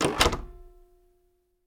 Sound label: door close; Golden Crayon Sounds